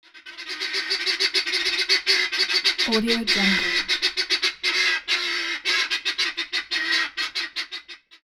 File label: helmeted guineafowl birds call